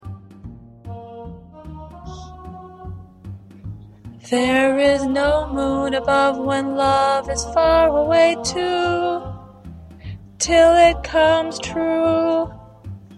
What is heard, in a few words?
Mezzo; jazz tunes; VOCAL PARTS; Mezzo part preview